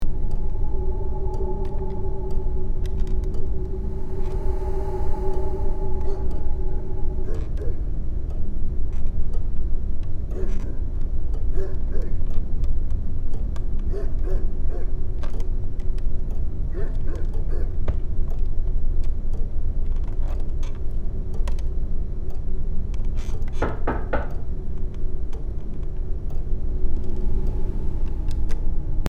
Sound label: Creepy victorian mansion ambience tension loop